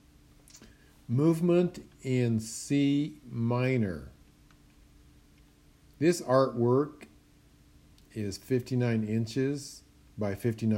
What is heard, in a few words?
Movement in C Minor